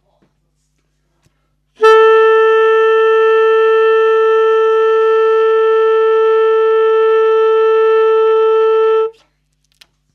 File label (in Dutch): Saxofoon met mengpaneel; meting sax